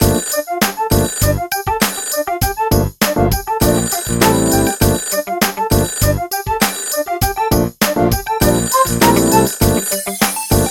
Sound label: Jig